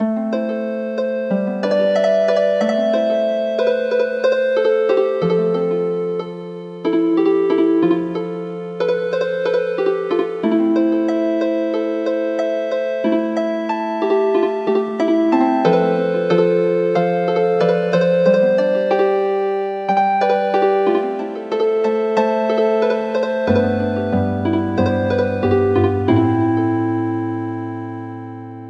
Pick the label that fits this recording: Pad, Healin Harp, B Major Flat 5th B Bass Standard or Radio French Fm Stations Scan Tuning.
Healin Harp